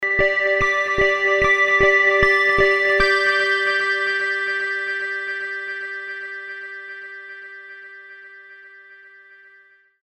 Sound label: Tubesynth Stars